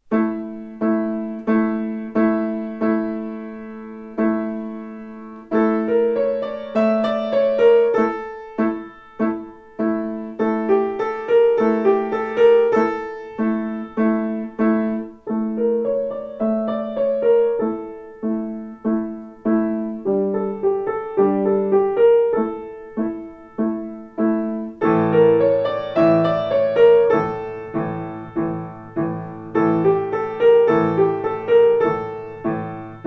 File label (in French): piano